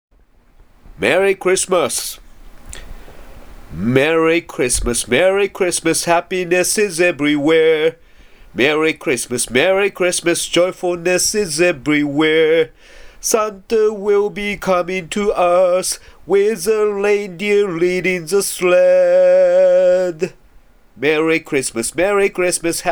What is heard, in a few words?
Merry Christmas sound